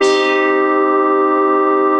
Lead, Synthesizer Middle C Notes; multisampled; synth keys

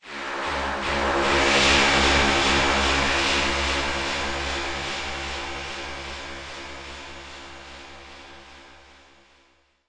descender defender; Electro and Synthetic

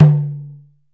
ROLAND SPD-20 PERC TABLA; ETHNIC and WORLD PERCUSSION

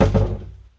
falldown knock, Kicks; single hits

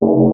dark note, synth

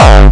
gabber kick; Kicks; single hits

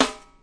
hi sharp sd, snare; single hits

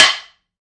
Wood Hit, Found Percussion Hits; ModeAudio Found Percussion Hits

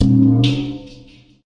wavedrum

